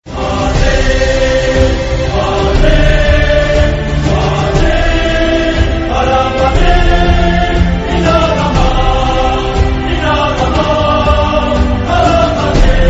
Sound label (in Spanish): tono de llamada